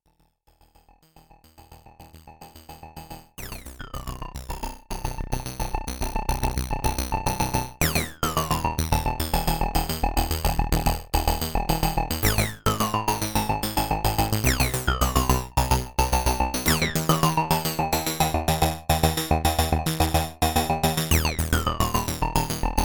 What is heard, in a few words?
A8 4 VCOs filter FM by LFO 2